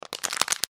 ペットボトルをつぶす

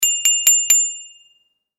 自転車 ベル

